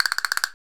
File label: ETHNIC PERC; Roland LA